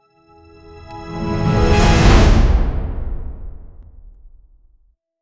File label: second short audio logo, melodic